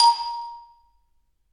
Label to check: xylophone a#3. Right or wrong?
Right